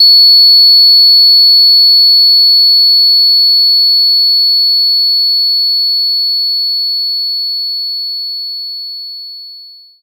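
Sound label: Ocarina F#6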